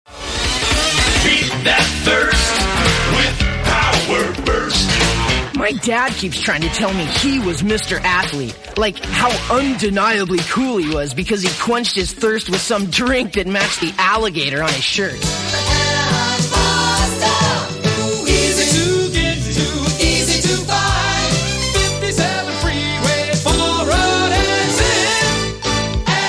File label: Jingles